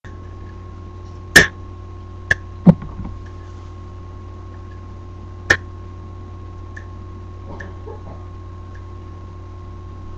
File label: Clicking sounds